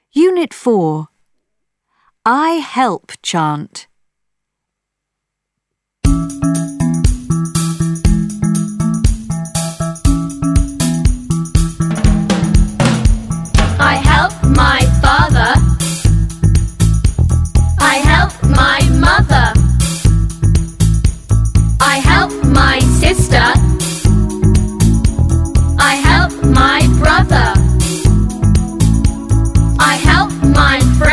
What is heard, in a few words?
i help chant